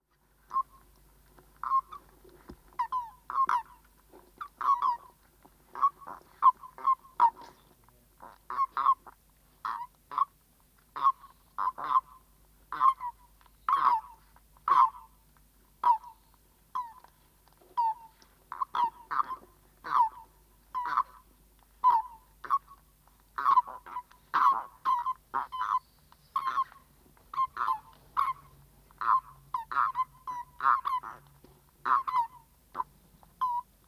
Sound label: Erdkroete Common Toad Bufo bufo; natur erleben am bodensee; Natur im Wandel